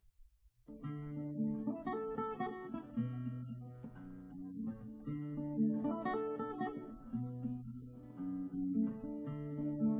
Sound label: test no drums live rec, underdetermined; SiSEC10